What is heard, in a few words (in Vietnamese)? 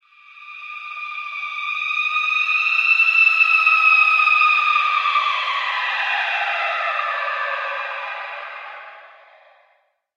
Tieng keu kinh di cua sinh vat khung long